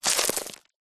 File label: stones and dust handful drop sprinkle; zapsplat; foley